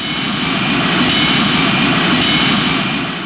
tube

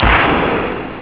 pop

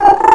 GOOD FROG; PUZMANIA

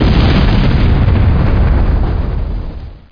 CAT HIT; LORDS2

